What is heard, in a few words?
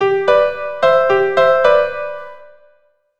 Blues RH G, keyboard